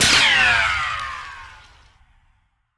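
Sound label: ricochet; gmod; viper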